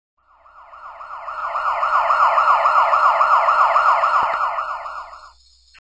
siren fade

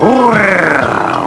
roaar; dmc

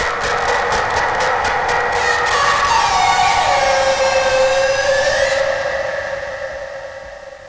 creepy; svencoop

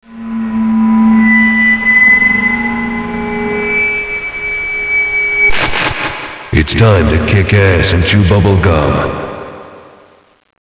mic out

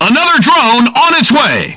rocket; gearbox; gunman

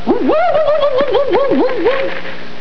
woo woo

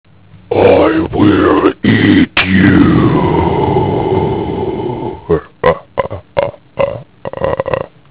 zombie

